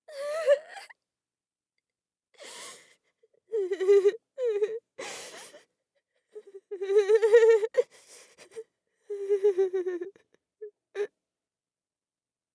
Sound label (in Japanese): 泣きじゃくる; 効果音なし